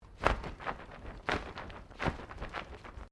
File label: Umbrella Shake; Objects